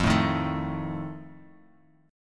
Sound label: D sharp Suspended 2nd Flat 5th F